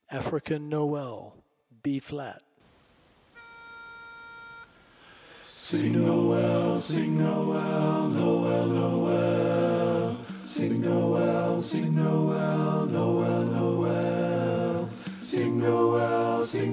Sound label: African Noel Lo Bass, Learning Tracks